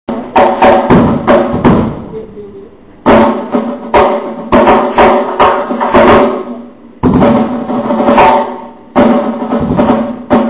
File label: solo